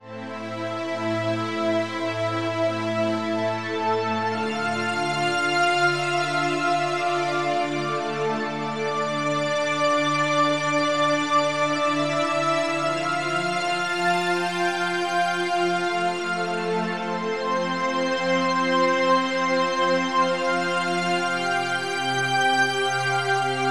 pad rising star

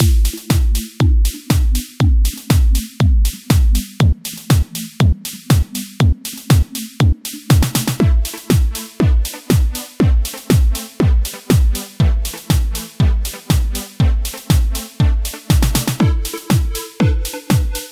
dance kick; Toum 1.0

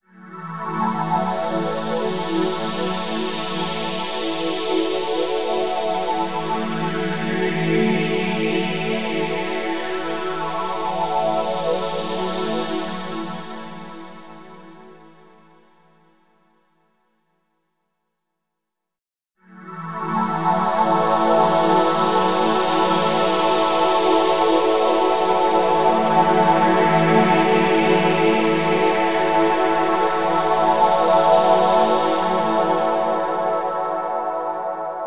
mod synth distant battle

